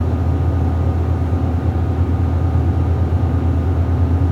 fridge hum; ambient

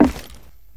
footsteps, wood; player

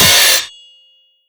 manhack grind; Half Life Alyx

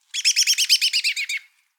bird, ambient animal

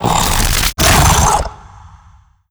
vox shockwave tell, zombie revived

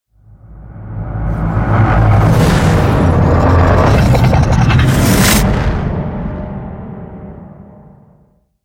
reverse advisor; scripted sequences; ending

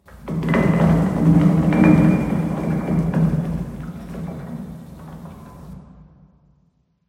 amb lowpass ping, ambient; Half Life Model Pack

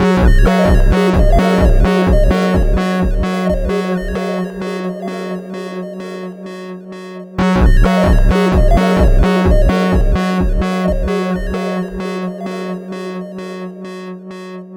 music; Portal 2; sp a2 laser chaining

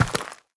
step light, footsteps, player, dirt

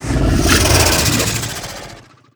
idle out, barnacle; Half Life Alyx

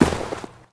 snow, player footsteps

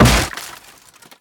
wood plank break

